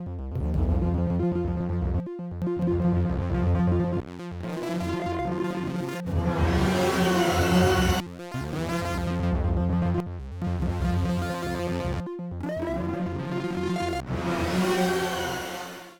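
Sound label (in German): Erica Synths Black Hole DSP2 Synth Effektübergänge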